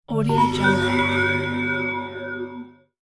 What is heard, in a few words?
sad fantasy spell; game sounds; طلسم فانتزی